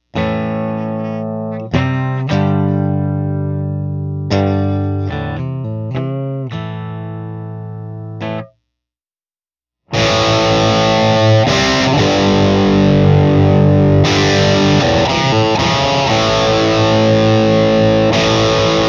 Marshall DriveMaster LP Bridge PUP

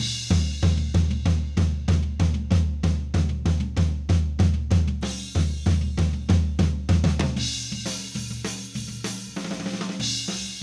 Toms ONLY NO EQ

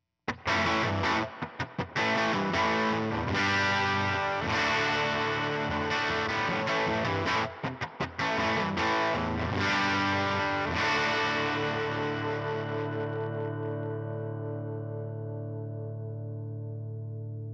Gibson Les Paul Princeton Reverb volume set to 7

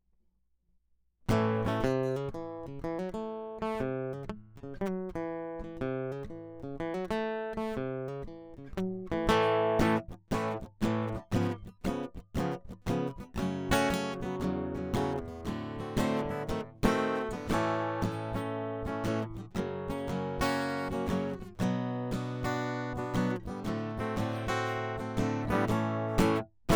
Strumming Pickup

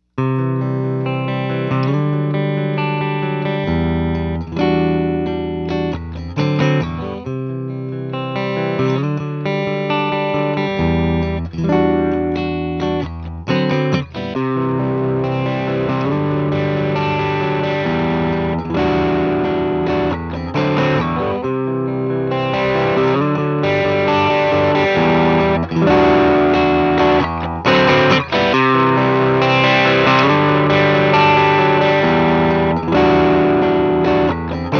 TONE KNOB 808

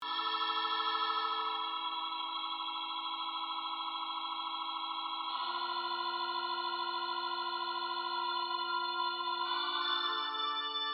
org verb only

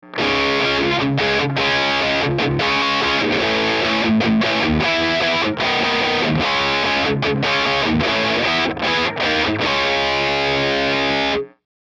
Electric Guitar Track

